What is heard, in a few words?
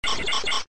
draw